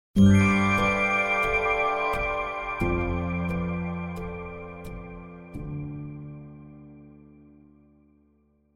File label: Company Innovative Ident